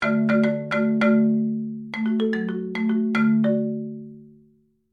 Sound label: marimba hard, wood, leather beaters; Tuned Percussion